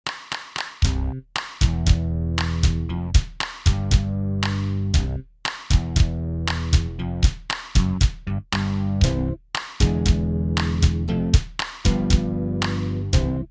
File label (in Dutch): Liedje met rap